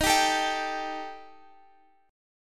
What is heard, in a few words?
D sharp Major Flat 5th D sharp Guitar Open F, chord; D#Mb5; strummed; x,x,15,14,12,14; chord shape